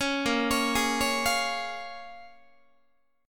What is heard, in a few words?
Bb Minor 7th, Guitar; Bbm7 arpeggiated